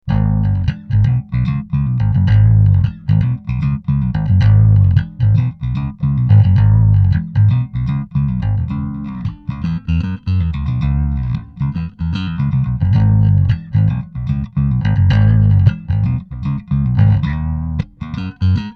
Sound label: riff